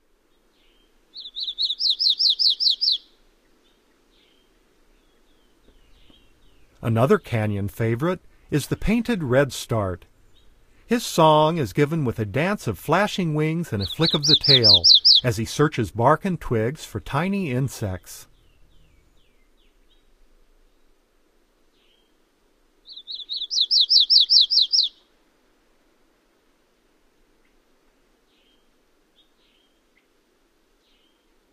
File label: Painted Redstart; North America; Common Western Species; Know Your Bird Sounds